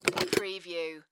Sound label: Coffee Pot Remove